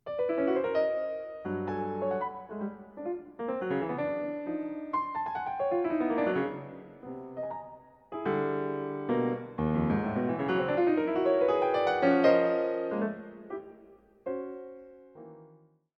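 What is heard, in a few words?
Micro Suite Final, piano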